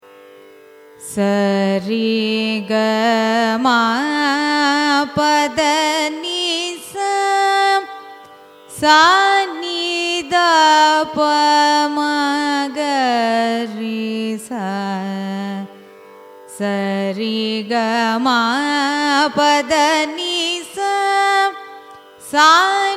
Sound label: raga shanmukhapriya arohanam avarohanam; carnatic music